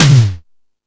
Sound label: Fusion Stix, Drum Machines